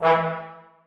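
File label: trombones staccato e2; Sonatina Symphonic Orchestra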